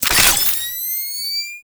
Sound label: Laser Flare Activate; Warframe; Lasrian; Lasrian Soldiers; Abilities; Prone Lancers